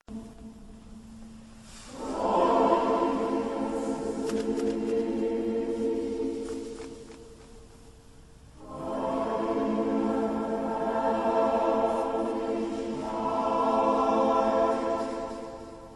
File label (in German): Himmlisches Licht, deutsch